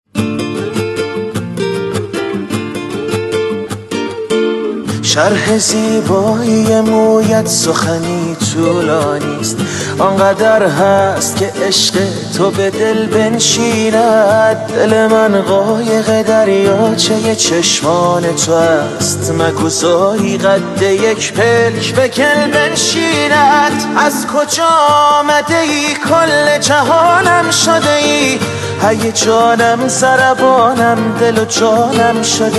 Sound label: pop